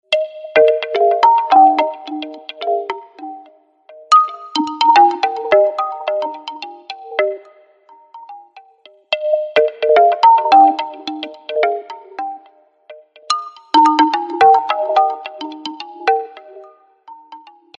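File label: falling ball cute musical mobile tune ringtone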